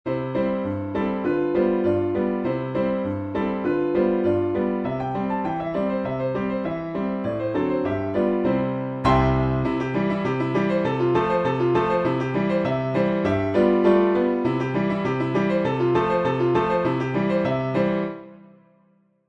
piano

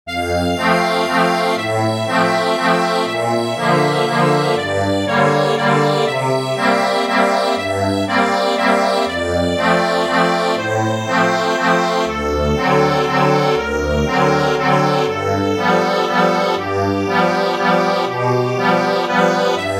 ACCORDION

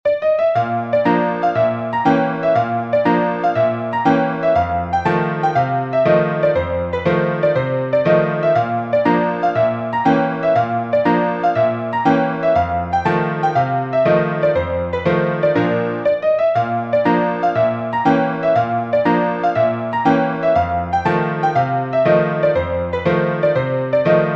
piano, sheet music

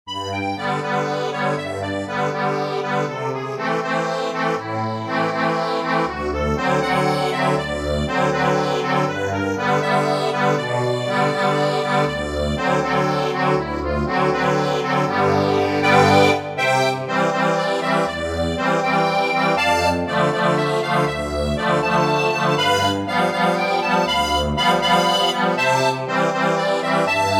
accordion, sheet music

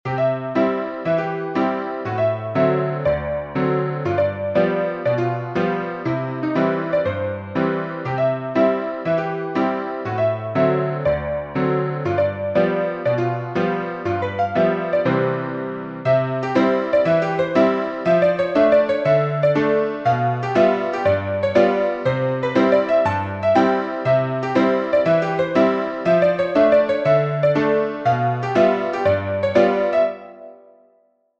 PIANO

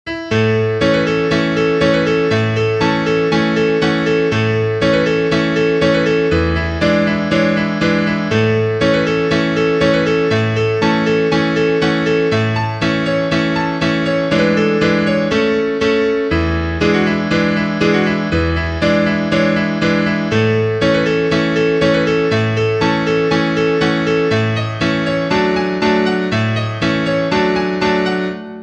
PIANO

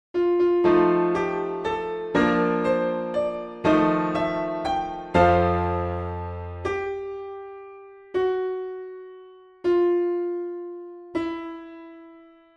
waltz, piano; Ivanhoe

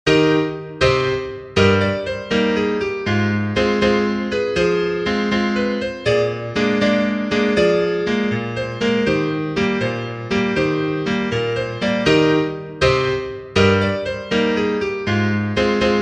piano

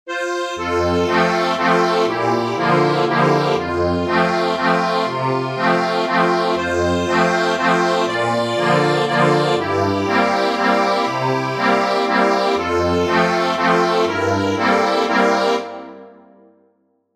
ACCORDION

